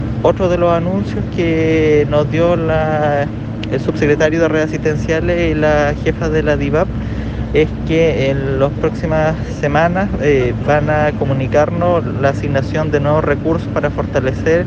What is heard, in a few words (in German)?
Handschuh